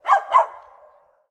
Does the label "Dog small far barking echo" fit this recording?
Yes